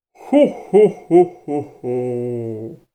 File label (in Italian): Risata Babbo Natale; la risonanza